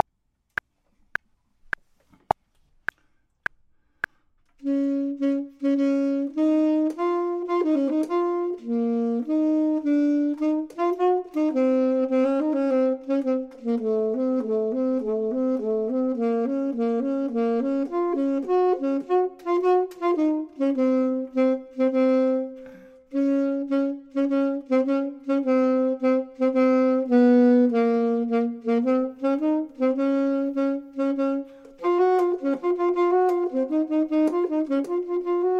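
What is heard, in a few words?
bottom, Saxophone and Clarinet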